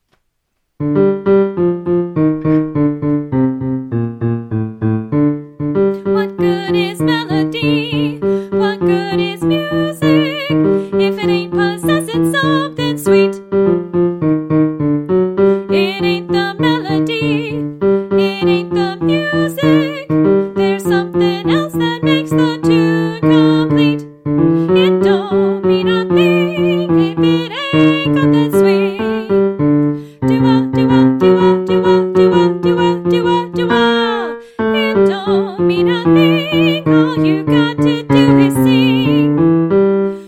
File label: soprano